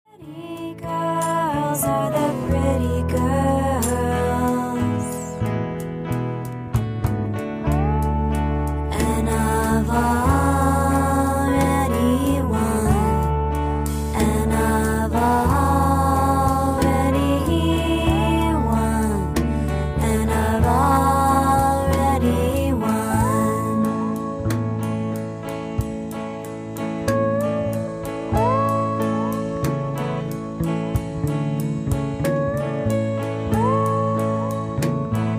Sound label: drum